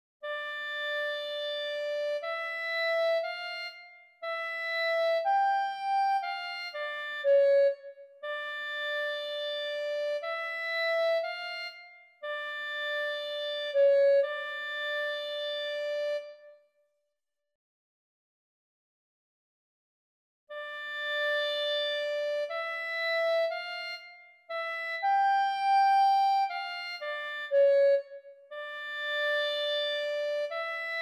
SANCTUS CLARINETTE; St Jean

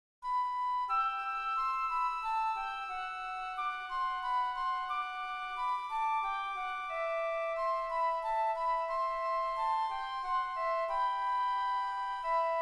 FLUTES

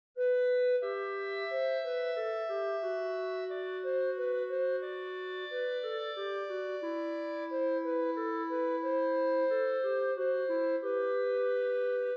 CLARINETTES

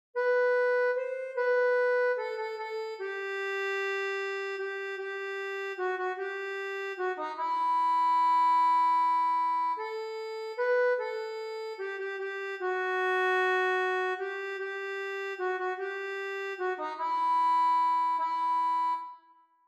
ACCORDEON; Il est bon de louer

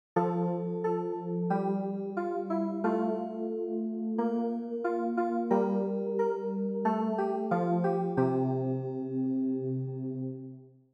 CLAVIER; Tu Es Pretre a Jamais

